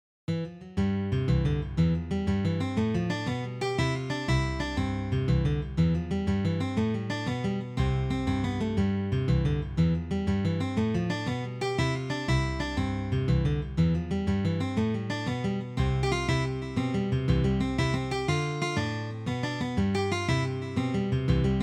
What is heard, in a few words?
Jig; Paddy Fahey's no. 12 Jig